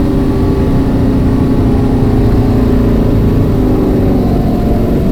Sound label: second shift accel down, car; vehicles; garrysmod; sincar